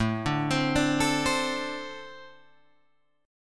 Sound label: A-Minor Double Flat 5th A Guitar Open G